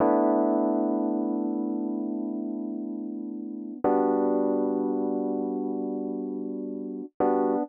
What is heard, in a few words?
125 Rhodes E, Keys, Nu Jazz House; PowerFX - Nu Jazz House